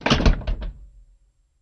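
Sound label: Cutlass Interior Door Close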